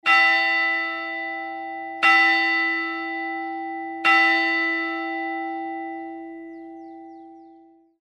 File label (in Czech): zvon; vaclav; zvonohra